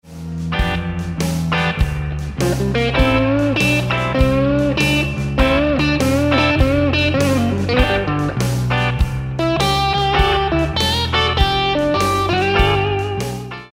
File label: Reconsider Lick, guitar